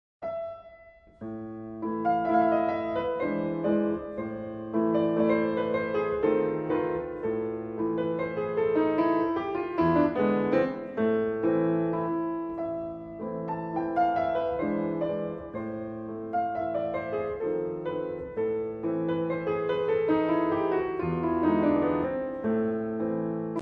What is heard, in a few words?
Mazurka